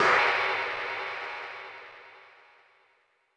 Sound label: U220 FX Set, Drum Machines; Roland U220